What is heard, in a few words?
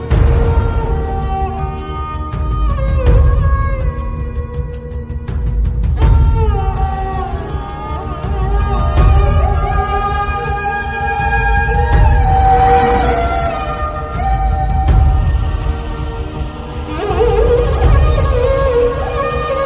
romantic bgm